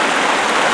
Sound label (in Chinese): 河流; 红色警戒2; 环境音效